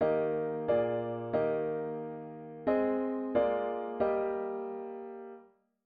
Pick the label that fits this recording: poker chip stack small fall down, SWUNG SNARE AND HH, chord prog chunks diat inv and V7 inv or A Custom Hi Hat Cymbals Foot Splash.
chord prog chunks diat inv and V7 inv